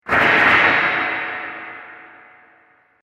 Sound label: Metallic Crashing Sound